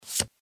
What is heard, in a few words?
cards reveal; poker game